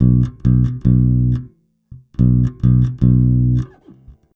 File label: 110 Pop Basic Bass C Bmaj, E-Bass; Pop Rock; Pro Tools Essential Loops; Digidesign